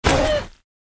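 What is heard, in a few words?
Worm wound voice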